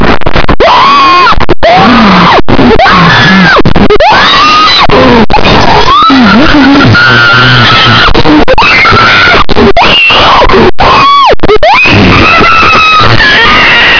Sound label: cat scream